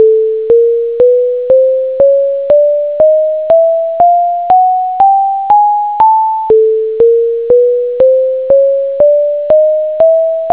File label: full scale